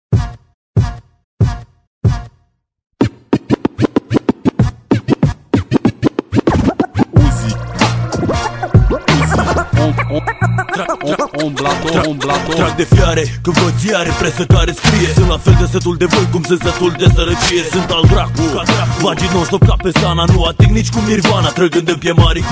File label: Scrach Mix